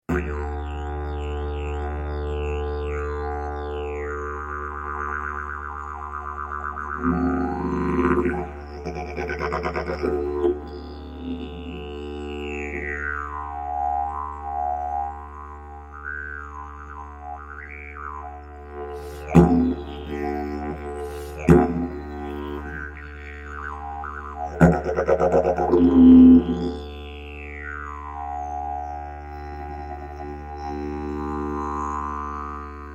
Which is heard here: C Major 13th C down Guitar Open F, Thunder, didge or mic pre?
didge